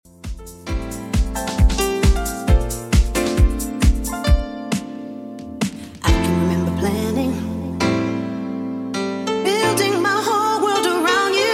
90s Redrum 134bpm CLEAN

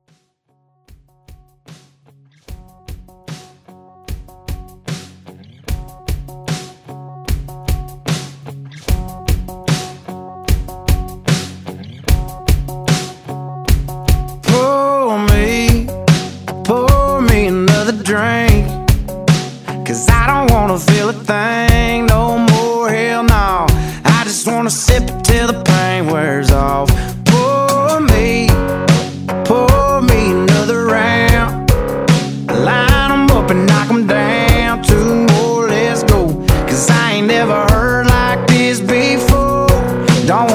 Country Redrum

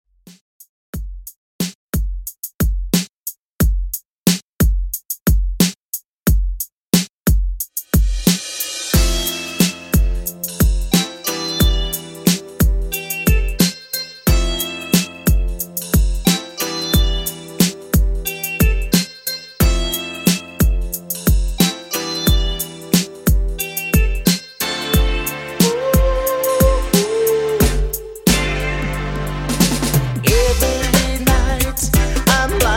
90s Reggae 90Bpm

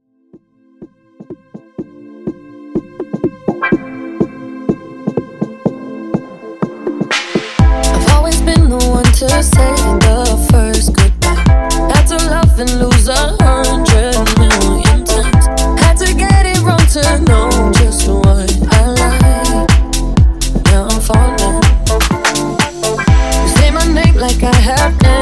Top40 Mashup; 2edits w Extended

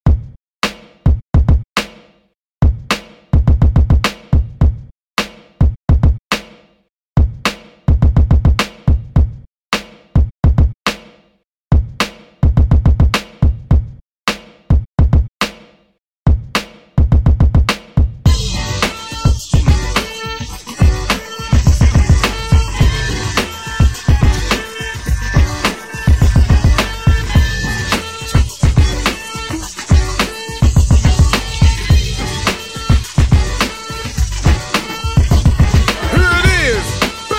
Funk Redrum 105Bpm

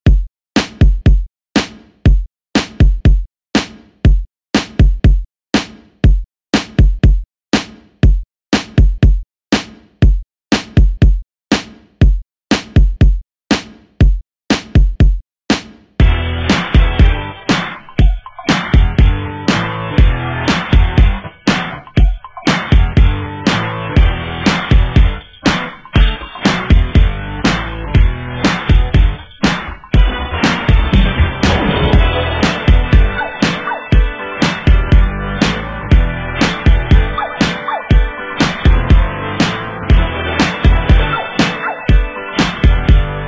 80s Grid Redrum 120 5Bpm

